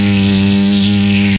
Electric Loop; retro arcade

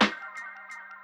Sparkly Snare, Drums; BB 2HTC Samples Vol 4

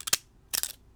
Jagged Scissors; bb - Smol Mechanisms

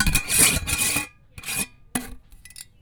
Metal Lid; BB Retail Therapy Sample Pack

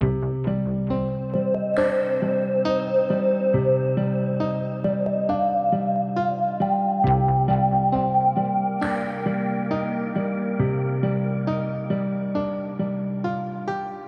Misty Arpeggio; Solos

